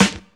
studio steel snare drum g key, steel snare drum tuned to the G note